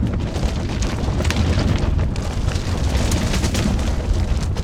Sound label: Auto Tires Rock Dirt Loop; SFX Kit; Automobiles